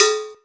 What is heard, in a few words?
cowbell